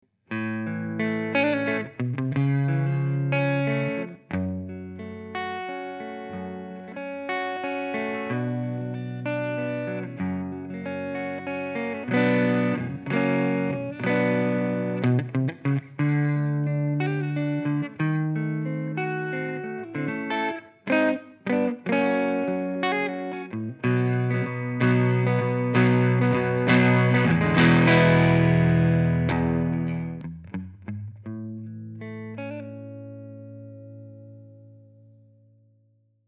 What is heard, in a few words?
Clean Strat